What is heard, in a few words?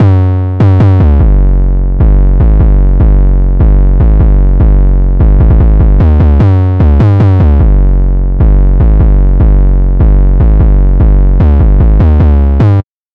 Fm 150bpm